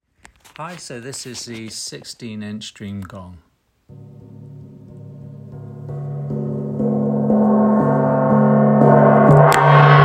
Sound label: gong